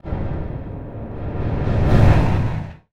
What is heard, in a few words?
portal in; underlord